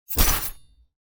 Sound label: Spike Trap; Minora-Game; Sound-Artlist